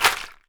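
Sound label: AXATSE, AFRICAN SHAKER; Voice Crystal - Steve Reid Definitive Percussion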